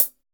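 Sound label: FUNKY closed hi-hat; POP-ROCK1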